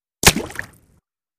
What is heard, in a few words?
Bullet Impacts Water